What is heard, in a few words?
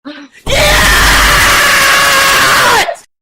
gyaaaaa; kai cenat